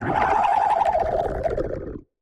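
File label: Flesh Death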